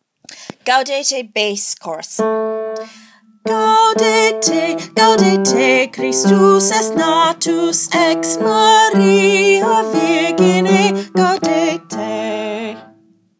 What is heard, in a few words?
Bass chorus; Gaudete